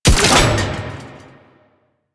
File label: Sniper Alt Fire Mech; DE; Warframe; Frumentarius; Exalted Sniper